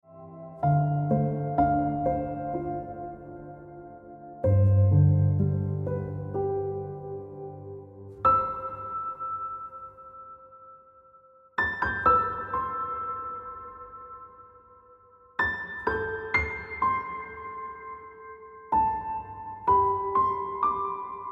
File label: Ringtone